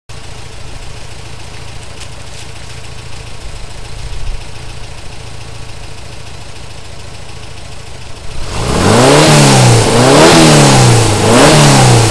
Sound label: muffler